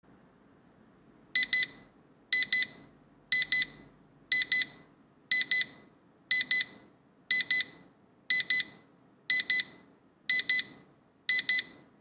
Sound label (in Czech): ukazka zvuku alarmu